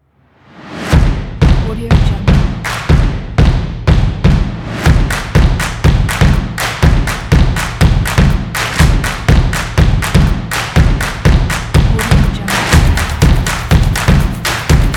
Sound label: percussion pack